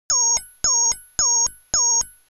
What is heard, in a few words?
sci fi alarm pager